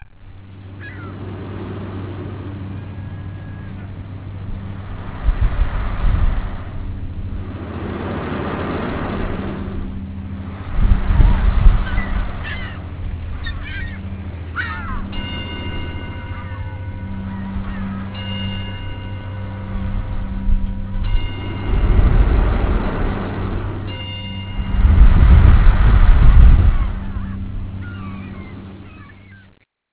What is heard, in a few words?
water